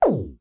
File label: Picto Box Cancel; Leave the Picto Box view (also used for the Telescope, and for selecting "No" to saving or erasing snapshots) (Recorded